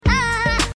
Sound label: Ahh